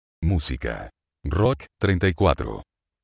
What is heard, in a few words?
rock